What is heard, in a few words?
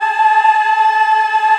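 A4 men women eh, Choir; USB Soundscan vol.28; AKAI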